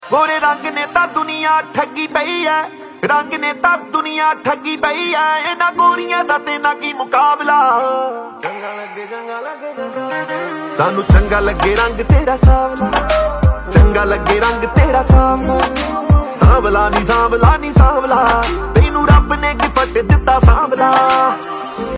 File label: ringtone